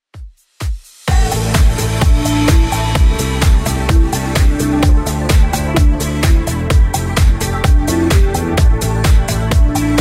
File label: 2ks Redrum 128bpm